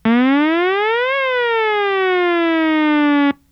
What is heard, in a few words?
Nord Lead 4 Enve mod